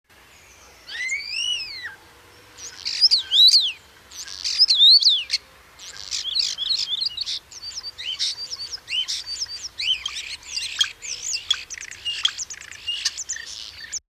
starling song

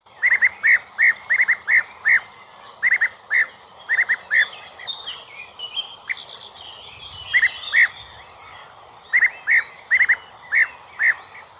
african bee eater call

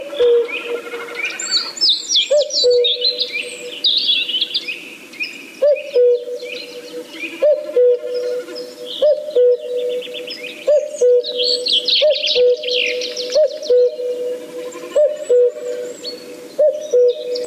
cuckoo song

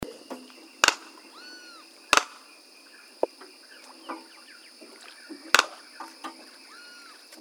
saddle billed stork sound

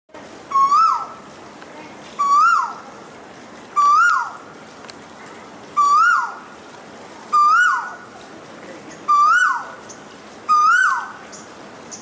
red billed hornbill call

